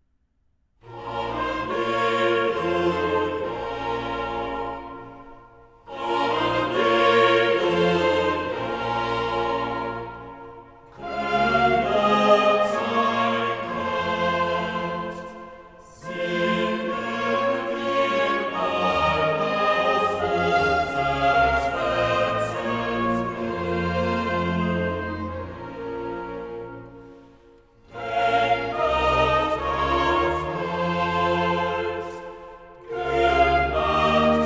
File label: chorale alleluja